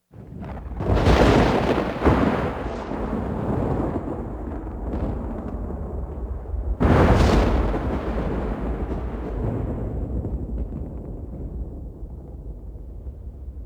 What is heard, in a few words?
thunder